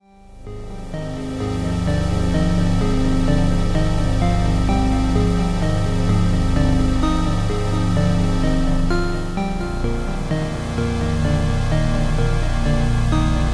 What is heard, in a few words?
ice 8bit; Yume 2kki